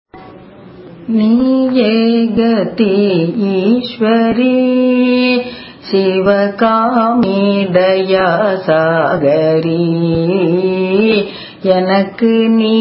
raga charukesi cine; carnatic music

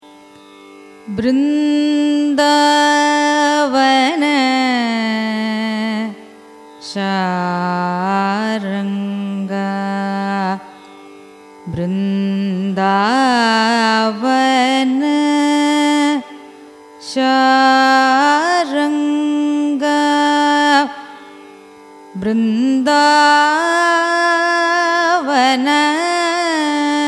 raga brindavana saranga signature, carnatic music

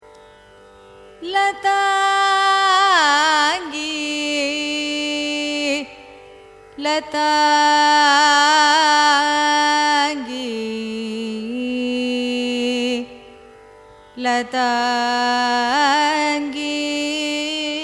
raga lathangi signature; carnatic music

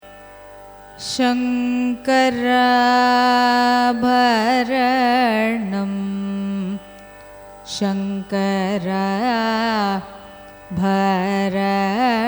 raga shankarabaranam signature; carnatic music

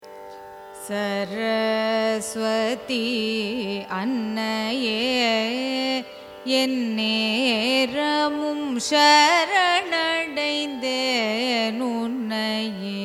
raga karaharapriya carnatic; carnatic music

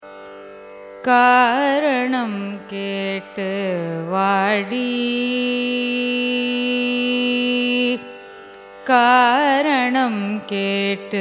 raga poorvikalyani carnatic; Janyam of 53rd mela; carnatic music

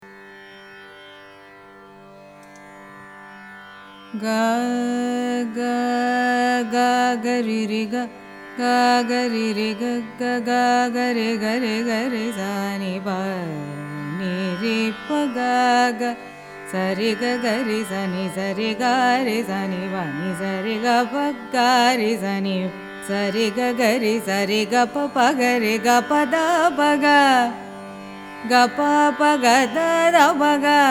raga hangout sample, carnatic music

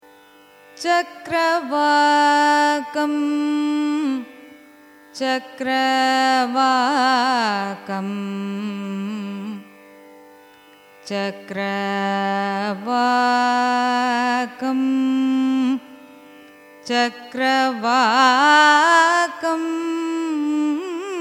raga chakravakam signature; carnatic music

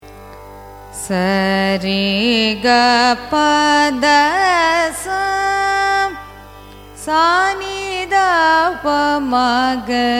raga bilahari arohanam avarohanam; carnatic music

